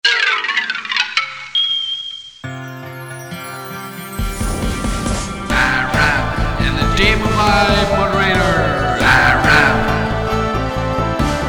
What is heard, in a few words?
Jingle; He-Man Demovibes